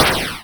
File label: cannon explosion; protectTheRoom